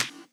Perc Shot; Multi Drum Loop 04

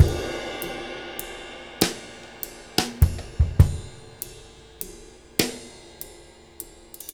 6/8 Loop, Drum Tools, Laid Back

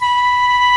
SHIAO B3

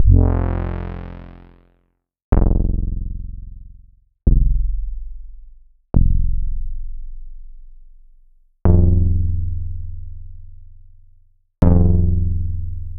Roland SH-09 Bass

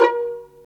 Vas Pizz; STR Vlas Pizz

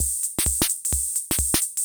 CR78

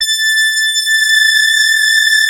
FAT LEAD, Powered DX; Wizoo

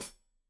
Hat Foot, Hi-Hat, Cymbals Stereo

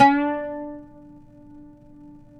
PLK OUD, Roland LA Composer

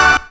chord, stabs

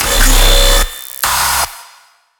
shriek, bass

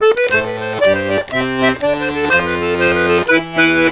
RONDO, Accordions; USB Soundscan vol.40; AKAI

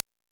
Timbale, E-MU Pro-Cussion; drum machine

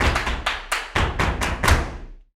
BULERIAS TAP, Flamenco

